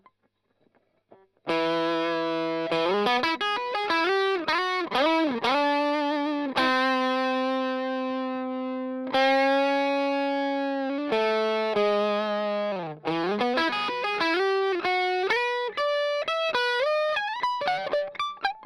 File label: Strum Line